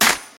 modern clap g sharp key, Loudest frequency: 4447Hz; single hits one shots